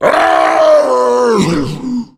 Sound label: tank death, zombie; Left 4 Fortress